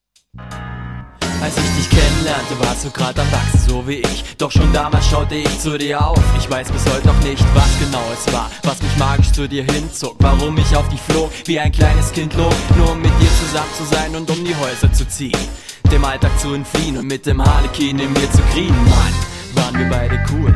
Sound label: hiphop